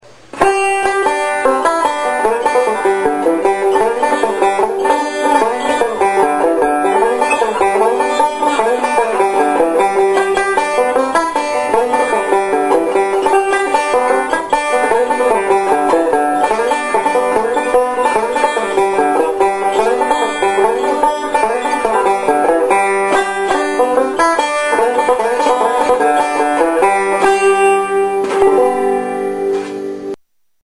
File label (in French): instruments banjo